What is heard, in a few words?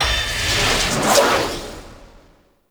Roar Attack Start, Infested; Warframe; Zealoid Prelate